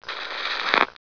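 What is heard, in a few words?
skip loop, turntable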